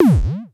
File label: KICK BOUNCE, Dual Percussion Synthesiser; Klone